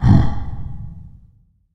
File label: Gorilla